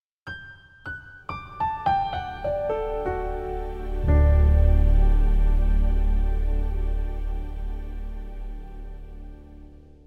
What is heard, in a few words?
Classic descending elegant landing subtle atmospheric piano